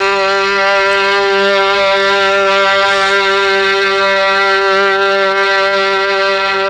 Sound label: MEL STRING; ILIO; Propeller Island